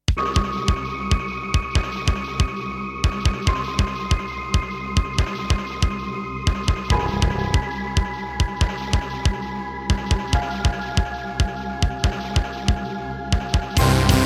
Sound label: Terratec WaveSystem SCW001-2; sigil doom episode 5